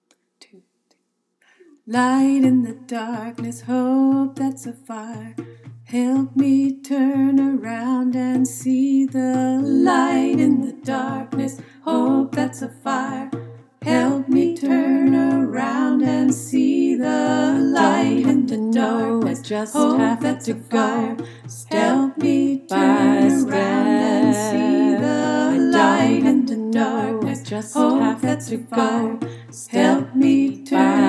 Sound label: Light in the Darkness all parts; freedom choir